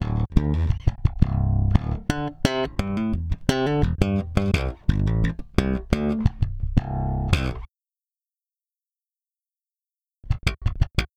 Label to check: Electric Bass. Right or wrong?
Right